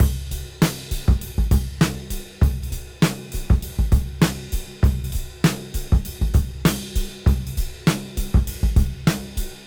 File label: Cym Beat 99 BPM